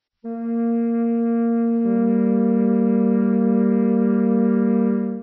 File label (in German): flöte; Intervalle, die man durch Potenzen von 2 und 3 darstellen kann